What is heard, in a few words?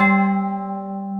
CRYSTAL A1; AKAI S-Series CD-ROM Sound Library VOL-8